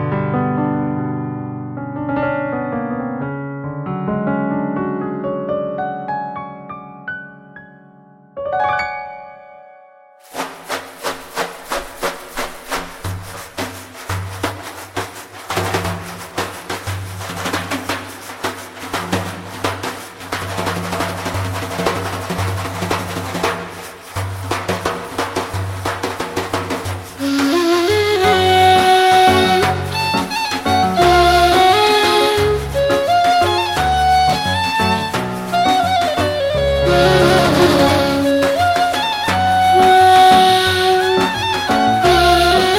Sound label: Ney